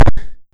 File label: shoot, weapons